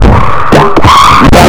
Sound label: LOOP DISTO 2; Big Beat Samples & More; AKAI; ALL LOOP KIT